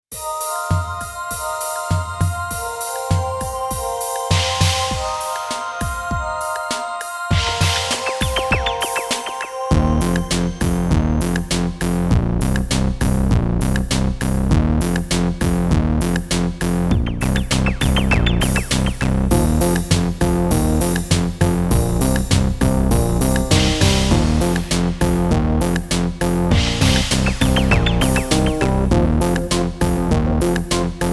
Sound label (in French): VERSION KARAOKE